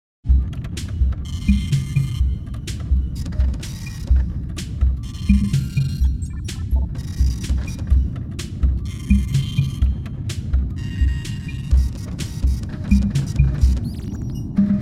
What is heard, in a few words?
The Pod - Ambience; LittleBigPlanet; interactive music